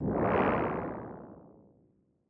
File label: cartoon space ship fly away